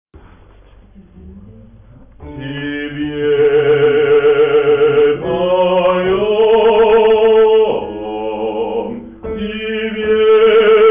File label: Basses; Tibie Paiom